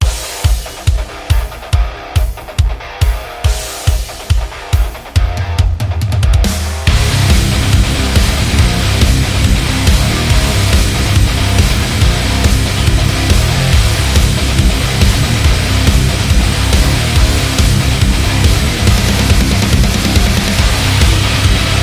The Metal

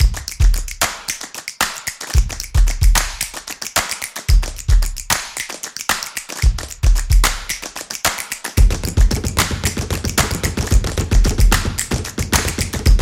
Drums Energy; percussive nation vol 3

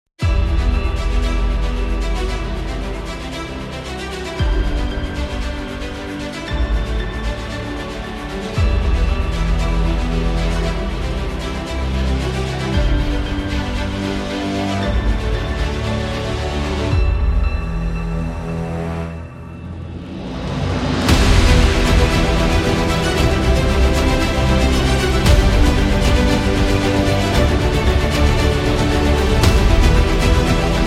Epic Soundtrack

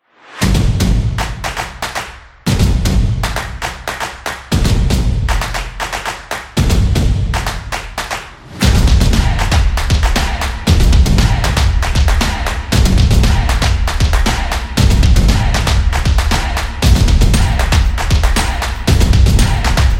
The Drumming Sport Beat

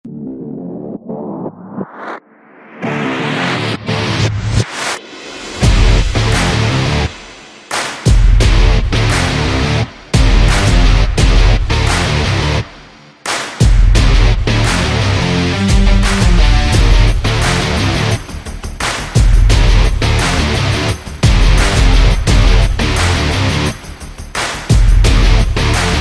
Sports, electro rock

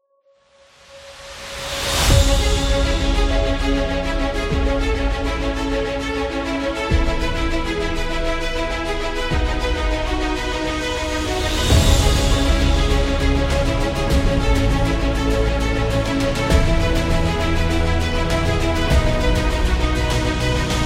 The Epic Trailer; epic action

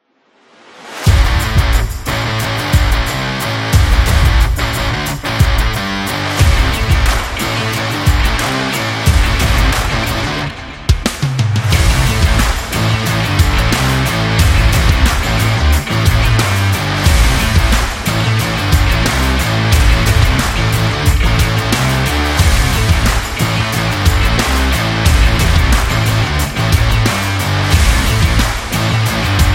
Gym Rock

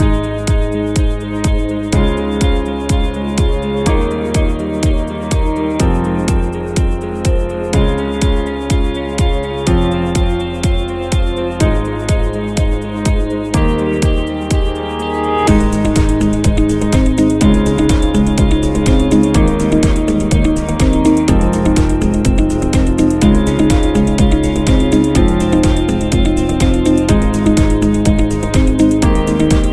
Corporate Upbeat; corporate music vol 5

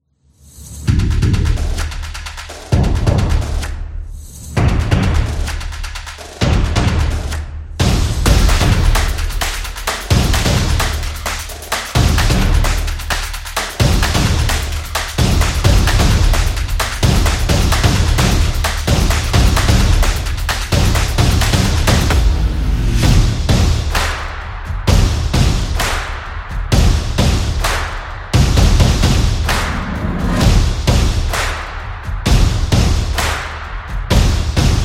Motion Graphic Background

